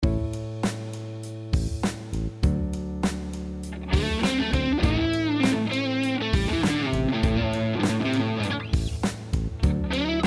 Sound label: Blues A G